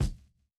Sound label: Bd Vintage